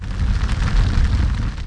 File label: on fire; Soldat